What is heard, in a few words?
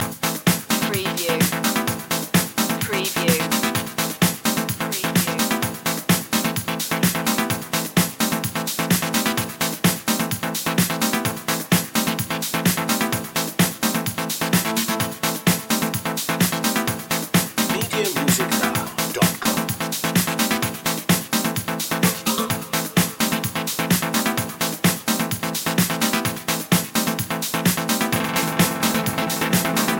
rebound loop